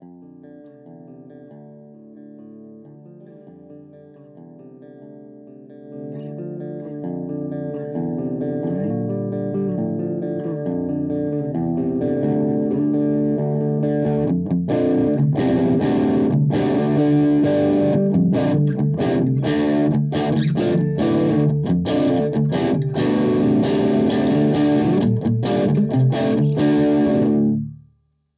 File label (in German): Verzerrer Pedale Volume Dynamics; Soul Food